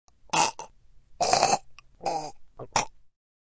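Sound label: choking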